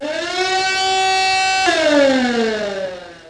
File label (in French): alarme, alarm